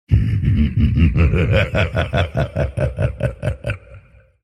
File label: laugh; kulle; Evil; Various Evil Sounds